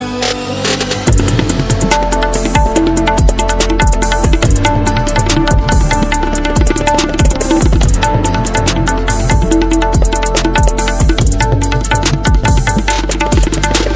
Tabla Edition; PUBG

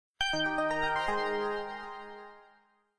Samsung Galaxy S10; Cassiopeia

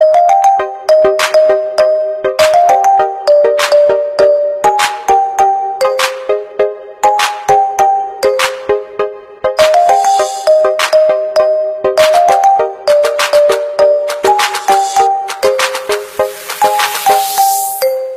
Marimba Remix

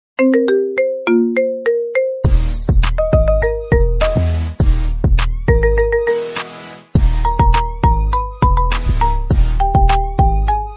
Make A Wish Marimba

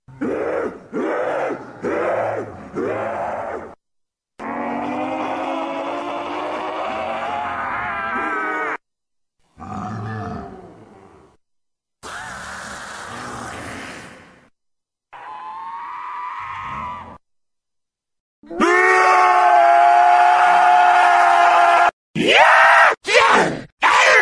zombies; call of duty

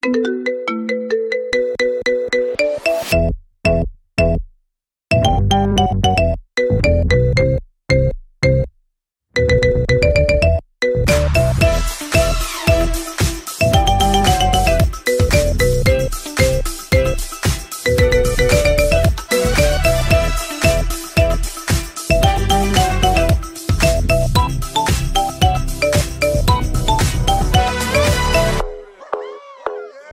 Marimba Remix

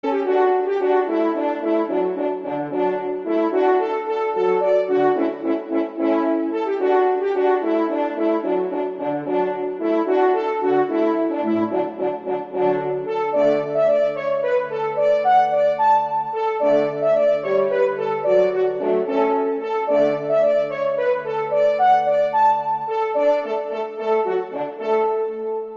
Country Dance, tono de llamada

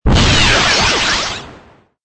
explosion robot

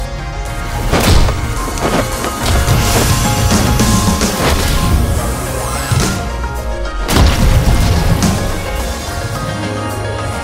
Cinematic Opening; Street Fighter V

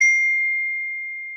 Samsung mensaje, tono de llamada

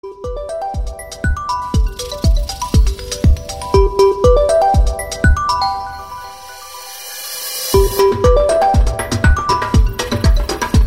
Samsung Galaxy S20 Ultra, tono de llamada; Adjective

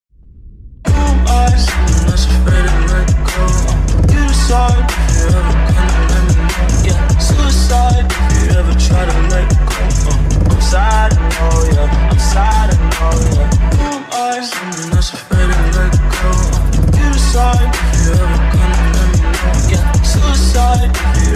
Sad, tono de llamada

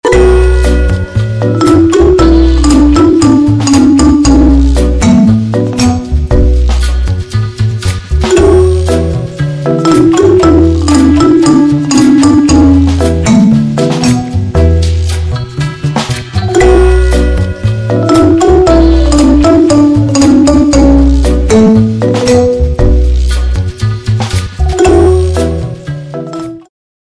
Marimba

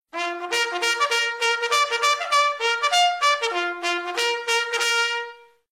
Huawei P20 Bugle

